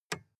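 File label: sports car interior lightswitch soft; verkehr transport; autos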